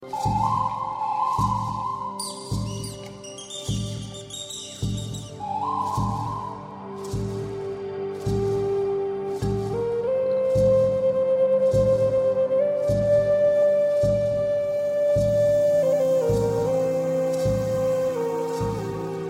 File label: incan pan pipes; Animals